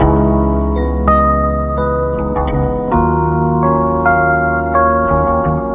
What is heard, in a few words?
keys